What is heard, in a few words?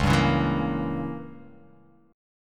C sharp Diminished 7th C sharp down